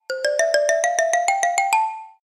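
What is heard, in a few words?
xylophone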